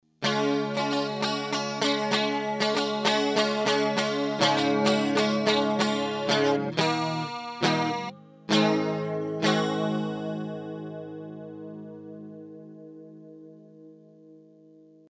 guitar chorus

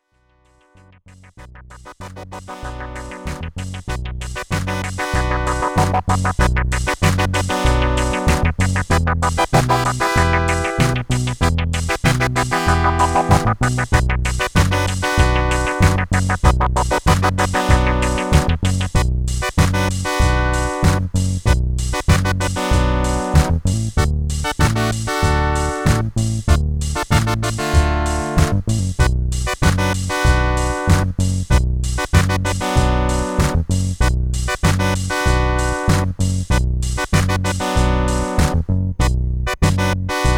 Wersi CX2 Disco

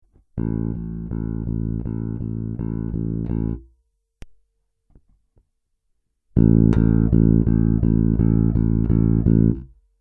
cort b4 plus as passiv aktiv

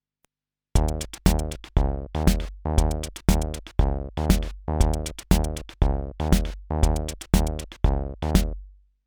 Groovebox flair med; goldmike mk2

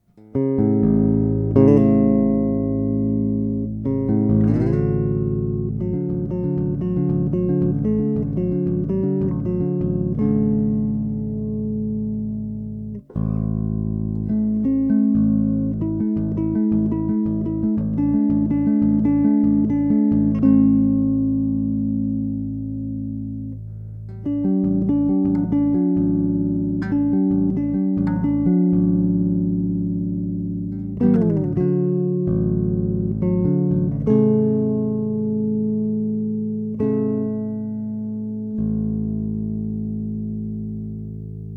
Both Chords

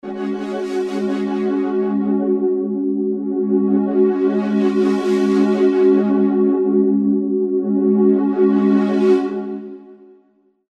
K5k Even Odd Mod

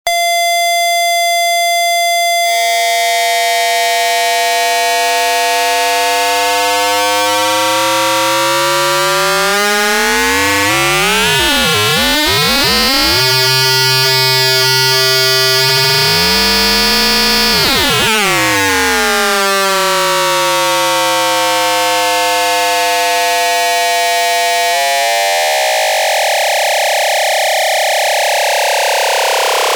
LFO Full Range

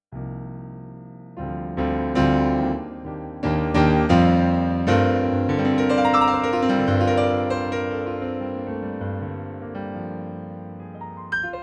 Yamaha Pia

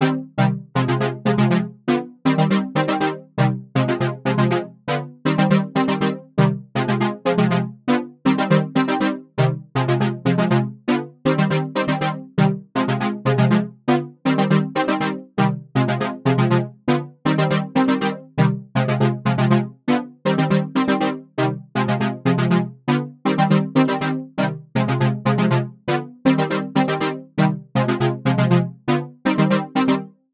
Jupi Brass SDD320 Chorus 120 C

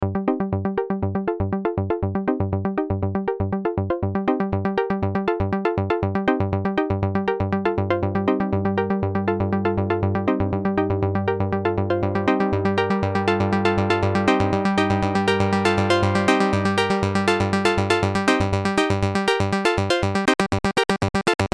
SH01a Sequencer Polyphonic Step Recording

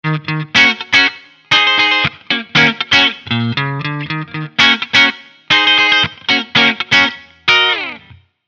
Jackson DK2 Middle clean

